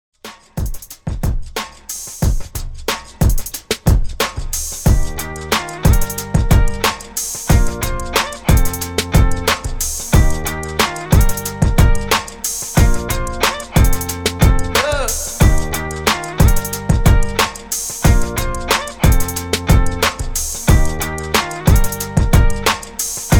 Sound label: REDRUM, 91 BPM